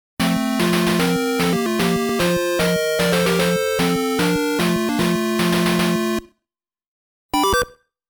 NES FDS Jingle

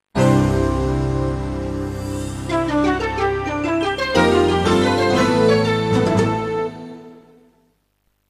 Philips CDi Startup Jingle; 44.1KHz Stereo

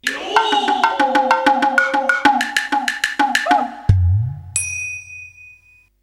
startup jingle; gc ver3; 44.1khz stereo 16bit; gamecube

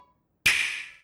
Startup Jingle Click; Nintendo Switch; videogame

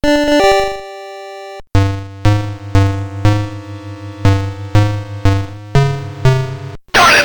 vectrex system startup jingle; videogame

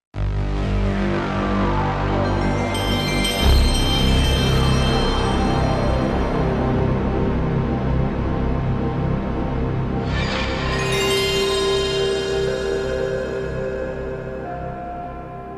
PS1 Startup Jingle; videogame

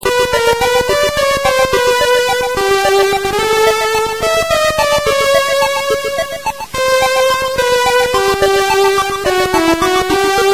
startup jingle; nintendo virtual boy; videogame

